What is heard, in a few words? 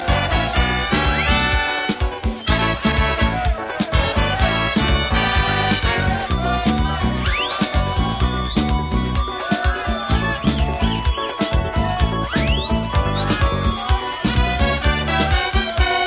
swing; calypso